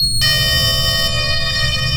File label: sonar Ping Water Medium Beacon Directional; Barotrauma; Real Sonar